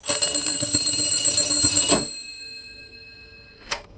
vintage mechanical alarm clock ringing and stopping; alarms